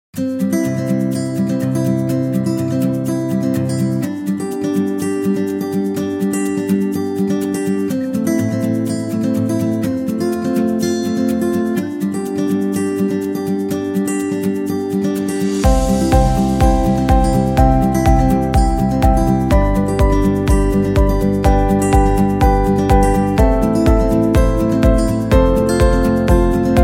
Corporate Upbeat Folk, corporate music; corporate music vol 16

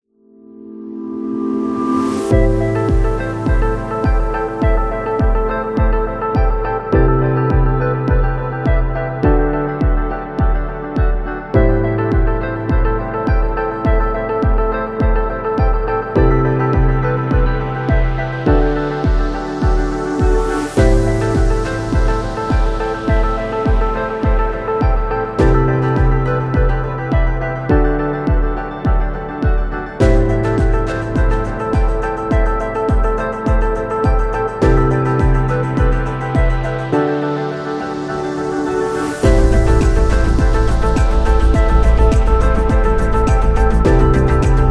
The Luxury Ambient; healthcare

